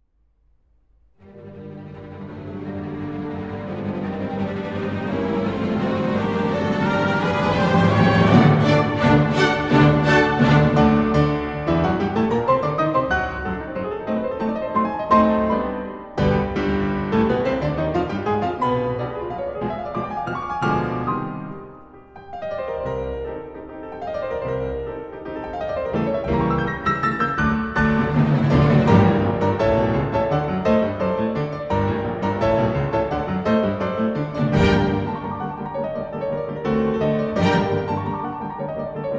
Piano Concerto in G Minor, Molto Allegro Con Fuoco; Mendelssohn; Piano Concerto No. 1; Op. 25 I.